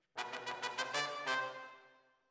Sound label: ORTF, Jazz for piano